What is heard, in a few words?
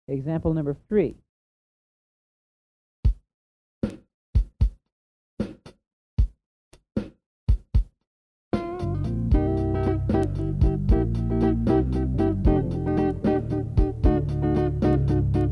Slow Blues In C